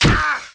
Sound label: got shot; outlaws